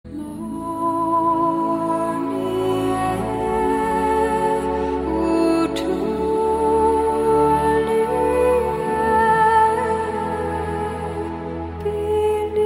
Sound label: Elf Music; Lotr